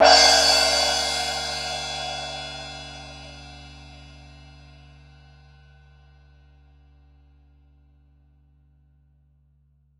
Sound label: Cartoon Drum Cymbal Crash; AdobeCC